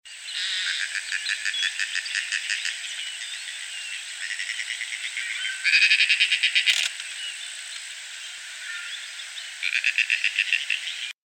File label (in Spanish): Caracolero, Caracolero (Rostrhamus sociabilis); Banado la Estrella